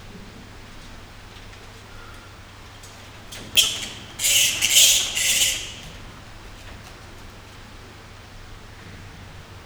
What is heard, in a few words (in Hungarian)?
indiai repulokutya puska; állathangok; nyíregyházi zoo 2010